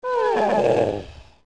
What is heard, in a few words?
Ahh; Biggoron; Zelda; OOT